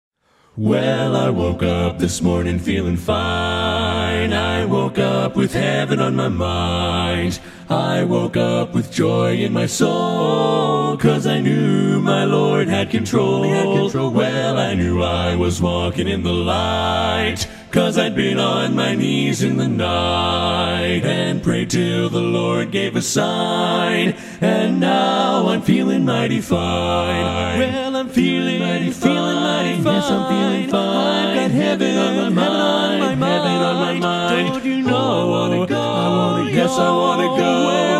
I'm Feeling Fine All voice

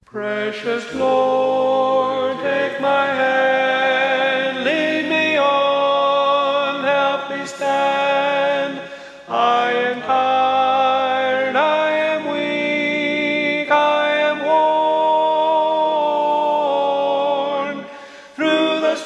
tenor, barbershop

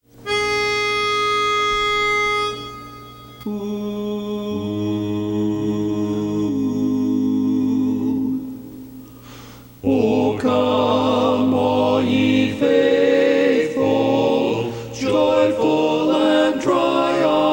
O Come All Ye Faithful tenor, barbershop

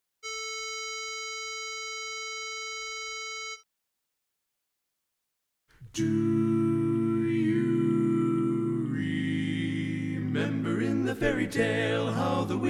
Tenor

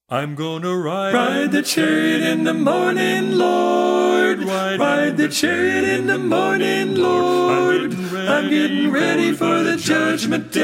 Tenor; Ride the Chariot

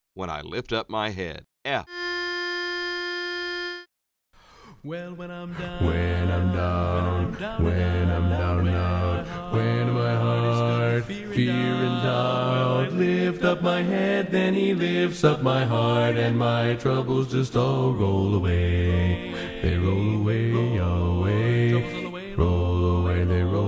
BASS

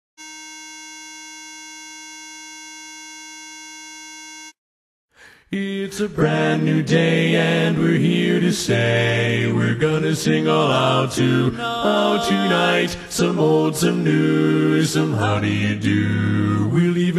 Bass

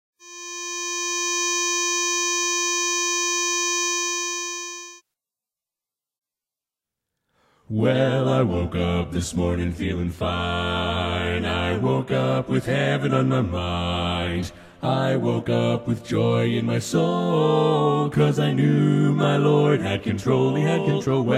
Im Feeling Fine bass, barbershop

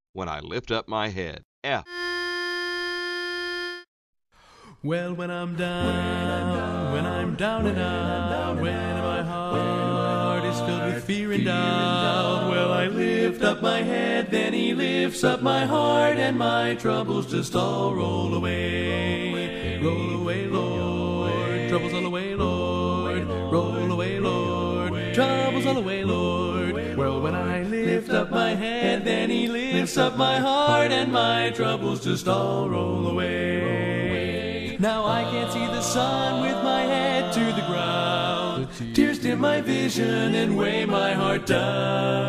Lead

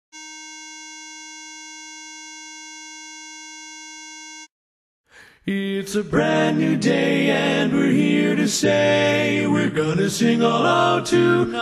Tenor

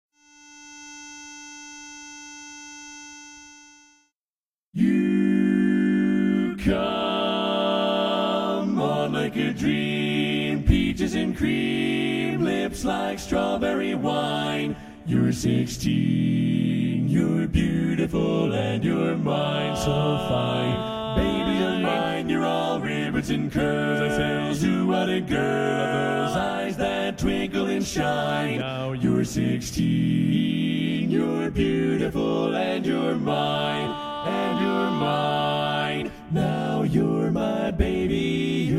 bass, barbershop

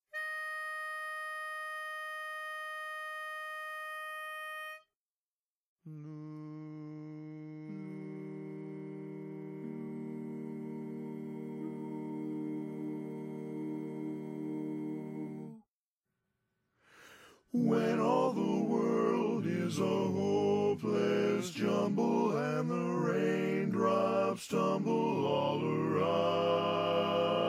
Lead

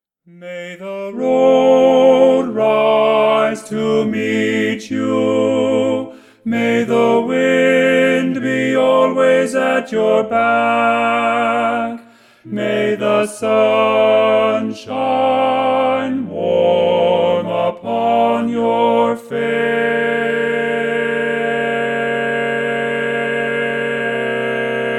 Barit

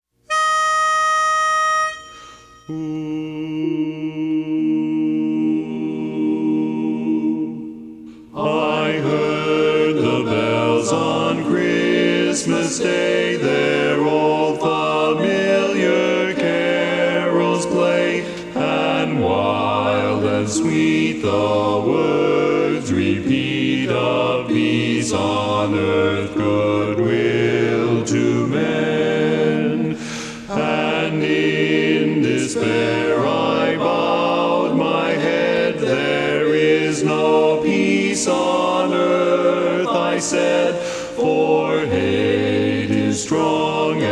Bass; I Heard The Bells On Christmas Day

